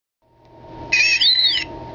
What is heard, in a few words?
r2d2 sound; ROTS